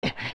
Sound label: Land Tech; Link